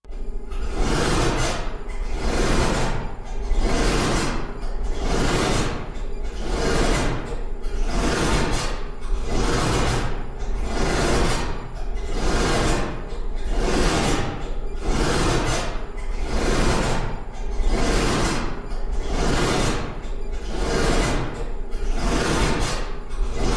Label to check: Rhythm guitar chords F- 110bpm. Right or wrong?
Wrong